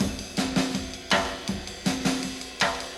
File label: drums